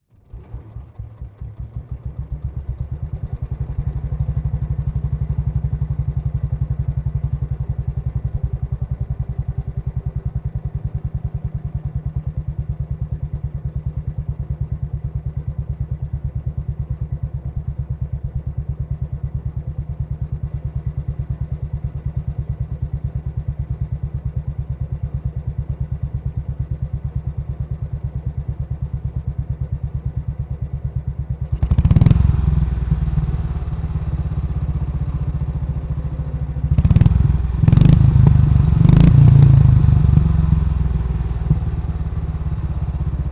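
bsa m20 onboard start idle steady blips off exhaust mix; sonniss gdc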